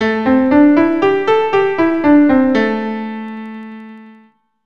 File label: A minor pentatonic, keyboard